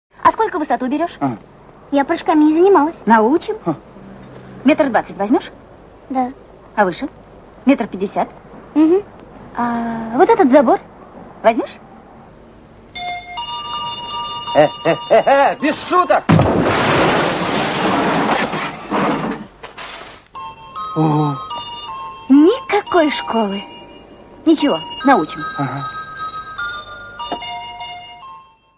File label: jump